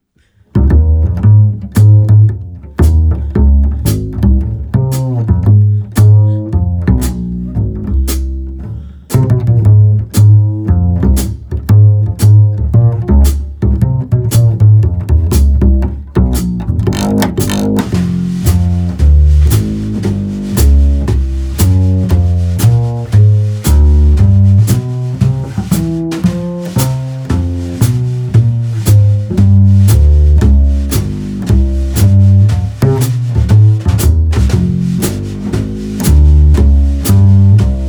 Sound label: Long Chilli Drums